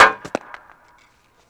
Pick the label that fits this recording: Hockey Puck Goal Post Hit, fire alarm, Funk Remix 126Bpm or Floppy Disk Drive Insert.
Hockey Puck Goal Post Hit